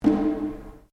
DRUM TAIKO SHIME ROPE P, Small Japanese Taiko drum; Miscellaneous Musical